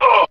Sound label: uh